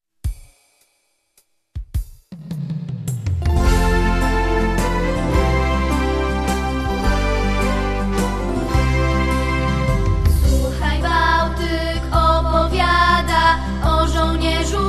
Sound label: hymn